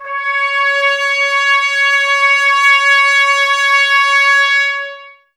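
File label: Brass trumpets C#, Mute; Miroslav Vitous - Woodwind Brass Ensemble; Tps Mute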